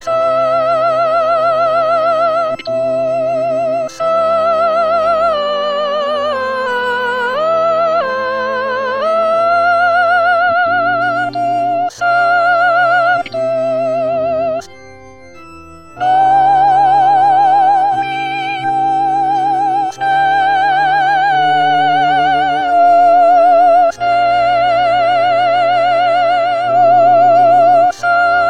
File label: Sanctus Soprano